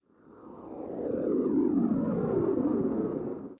Doppler

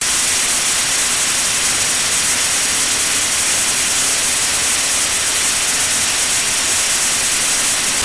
Tv Noise

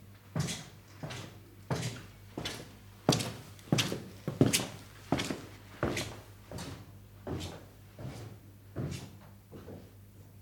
Boots

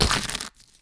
Smashed Egg

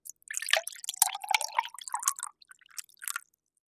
Serving Water Slowly

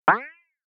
Springy Ui

